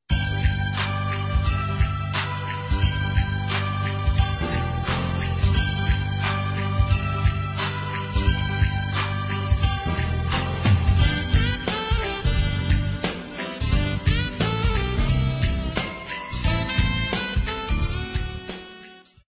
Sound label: Jazz